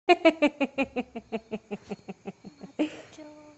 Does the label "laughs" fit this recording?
Yes